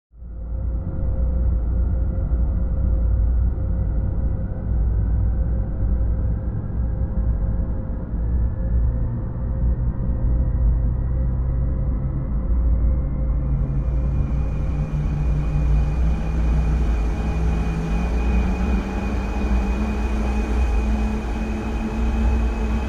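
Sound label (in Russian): космический корабль запуск двигателей остановка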